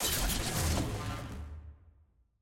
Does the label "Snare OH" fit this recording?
No